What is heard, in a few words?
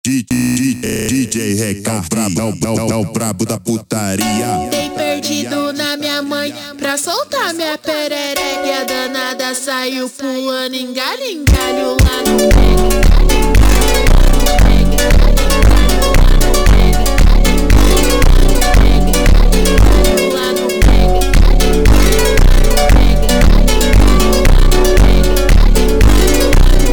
slowed, funk